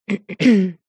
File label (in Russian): zvuki pershenie v gorle kkhm